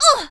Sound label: Fem Hit Hurt; Afterbern Democralypse; Windows